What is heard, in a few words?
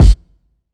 long tail reverb kick drum single shot g key, steel kick drum